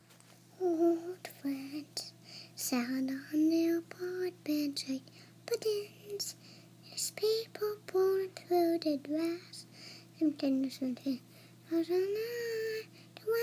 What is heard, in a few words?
singing Old friends